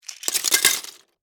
Legos Drop Handful, Into, Box, Plastic, Toys; Foley; Drop Sound Effects; BlastWave

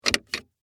Metal Latch Move, Foley, Metal, Latch, Movement; Latches; Objects

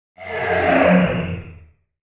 Out Of This World Strong Whoosh; Andrew Fleming

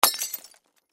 Crash Glass Vase Drop Break; Breaking; Sound Effects:Objects; Blastwave

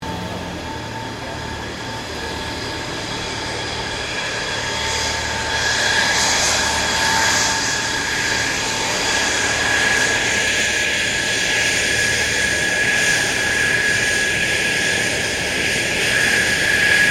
AIRCRAFT F16 FALCON TAXI SHUTDOWN; Airplanes; Vehicles

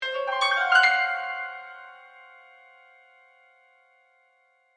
Piano Dark, Flourish, Mysterious; Horror, Musical, Accent, Piano; Scary Tone; BlastWave

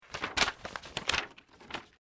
PAPER SHEET MOVEMENT UNFOLD; Material components for constructing more complex sounds

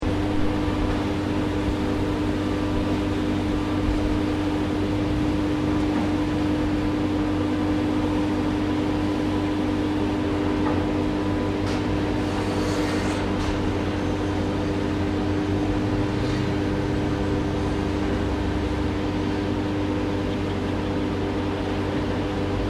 Industrial Sawmill General Ambience Loop; Sawmill operating; Machinery; Machines

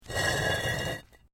Brick Medium Hollow Move; Material components for constructing more complex sounds; Rock Sound Effects

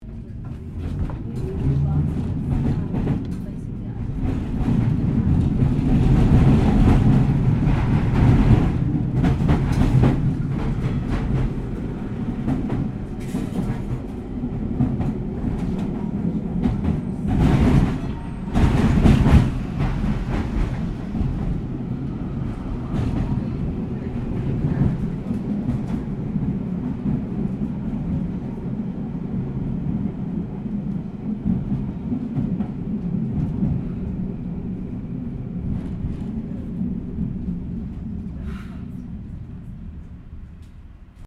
Tram Melbourne W Class Start Stop Short; Streetcar; Streetcars; Vehicles

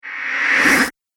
Ghost Whoosh; Ghost Sound Effects; horror

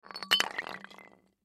Beer Bottle Roll Off Curb Drop Puddle Gutter; Rolling; Foley; Sound Effects:Objects; SoundEffects-Blastwave

